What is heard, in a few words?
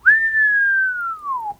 whistle